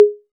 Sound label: TR-808 Conga; Roland BK-5; Percussion